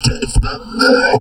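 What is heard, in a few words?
talk, drum loops crazy processed, 160 bpm; USB Soundscan vol.07; AKAI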